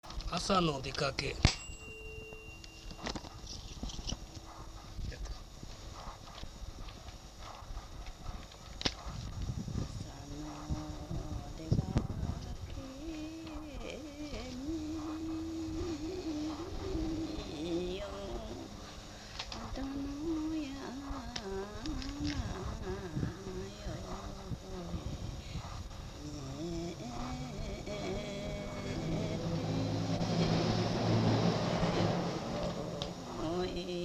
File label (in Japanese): asanodekake, minyou; 朝の出がけ; 千葉市